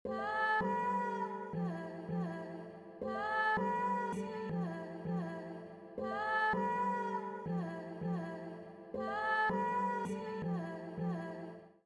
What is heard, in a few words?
Cutting Slicing